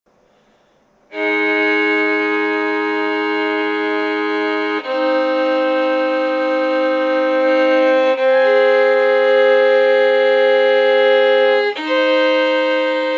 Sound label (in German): double stop As dur